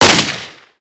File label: gunshot